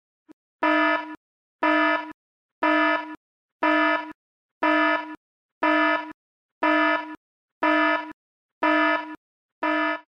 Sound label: warning